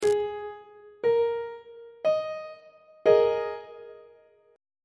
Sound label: a flat suspended 2nd chord on treble clef